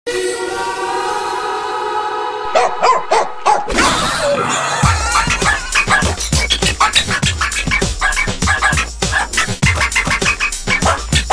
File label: Disco dog; Rutipa's quest